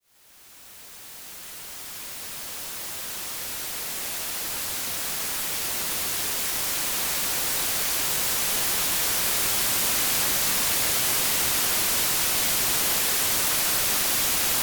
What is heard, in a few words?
Noise